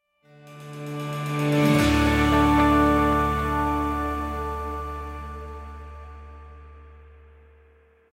Corporate Ident

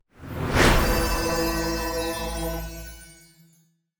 Choir charm; BOOM Library; HEAL

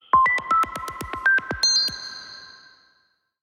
Modern Notification Energetic

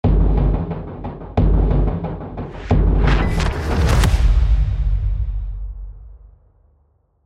Drums of War Decisive Impact

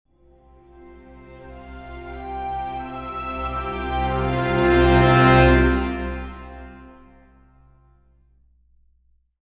Orchestral Logos Majestic; Ni Sound; Pictures

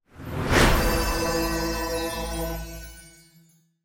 Heal choir

